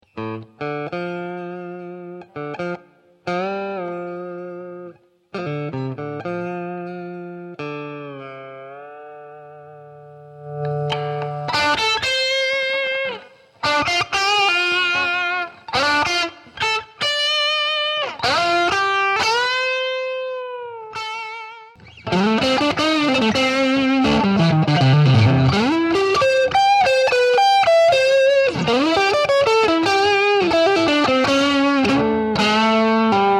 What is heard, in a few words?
strat